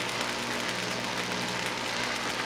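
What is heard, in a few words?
Weapon alien cannon idle loop; Wpn dlc05aliencannon idle lp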